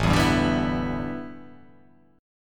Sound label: A 9th Suspended 4th, A9sus4 chord; strummed; JGuitar